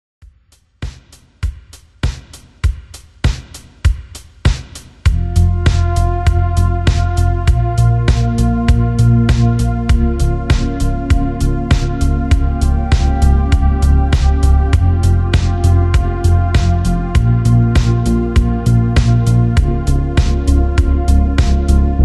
retrowave synthwave cover slowed

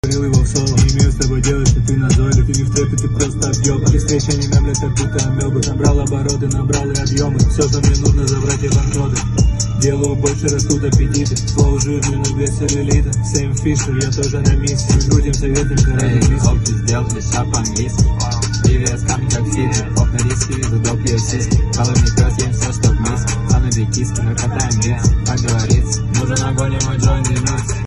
solo

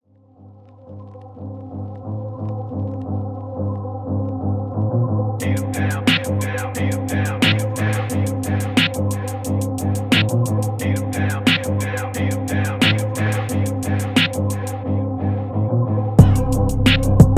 phonk type beat

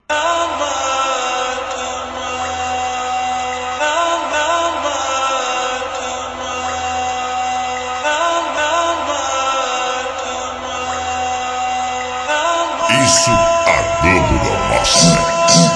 slowed reverb

